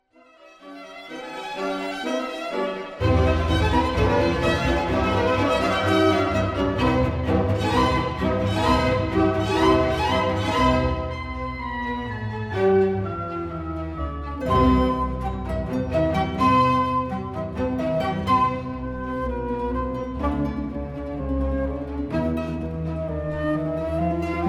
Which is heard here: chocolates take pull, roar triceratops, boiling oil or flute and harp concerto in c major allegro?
flute and harp concerto in c major allegro